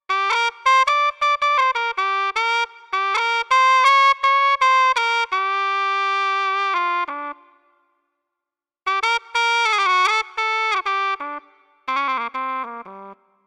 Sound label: Cup Mute, solo trumpet; Trumpet with Cup Mute